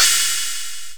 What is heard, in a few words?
Hit Cymbal Low